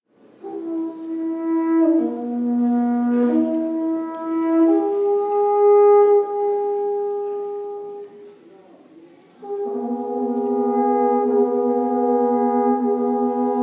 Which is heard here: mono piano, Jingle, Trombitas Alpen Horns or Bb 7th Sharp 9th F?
Trombitas Alpen Horns